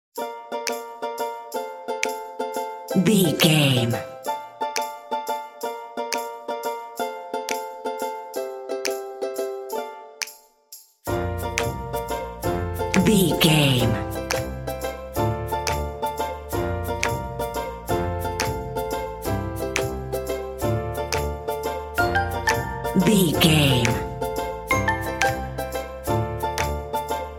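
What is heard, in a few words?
happy ukulele bells and piano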